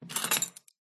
glitched tones household dining room tile table cutlery pick up clatter